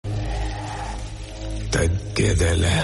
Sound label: Telugu Sms Ringtone